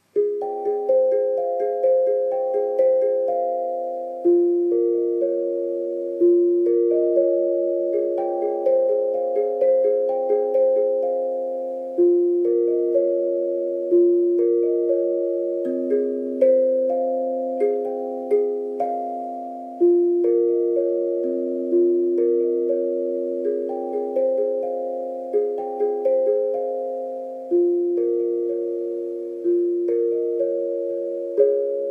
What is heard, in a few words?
Zenko Harmony Impro Hand